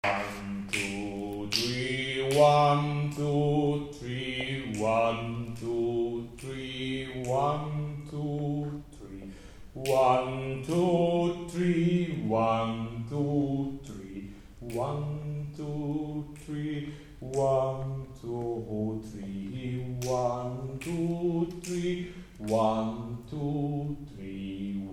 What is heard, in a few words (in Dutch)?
sjlof majn kind alleen bas; Oefenenen; Jiddish ensemble TOCH